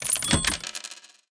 Payment

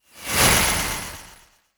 Aggressive Transition

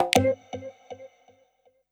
Notifications and Buttons

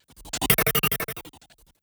Strobe Transition

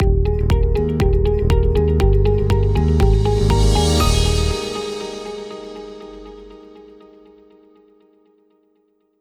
Corporate Transition

